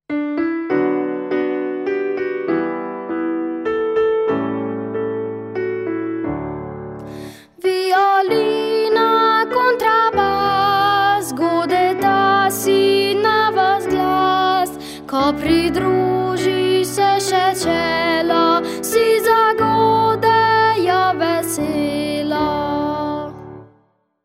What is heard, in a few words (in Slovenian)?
VIOLINA KONTRABAS